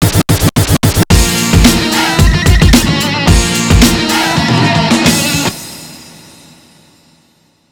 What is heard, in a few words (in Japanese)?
出囃子